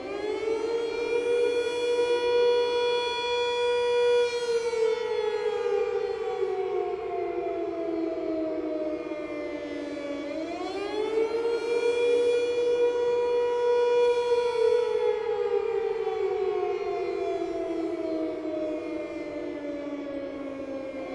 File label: city siren loop; world misc